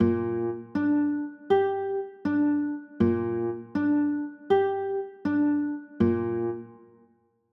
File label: b flat 9, chords